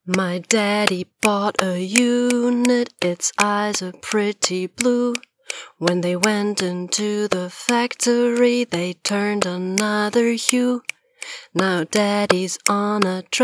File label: factory secunit with clapping